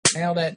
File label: clap